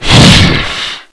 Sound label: demon melee